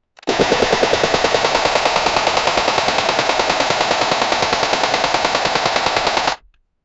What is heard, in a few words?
hunting rifle shooting on, firearms